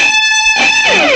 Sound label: fuzz; GUITARS1